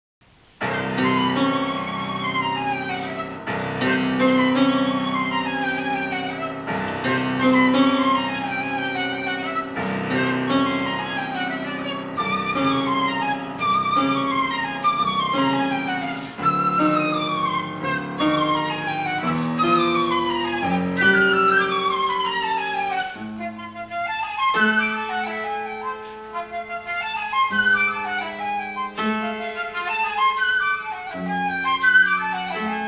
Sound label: Fanfare